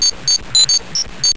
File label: communicator beep; Saban